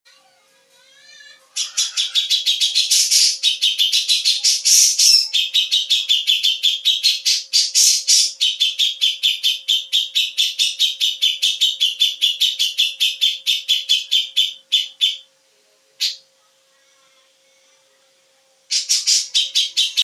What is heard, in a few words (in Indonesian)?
cucak jenggot bunyi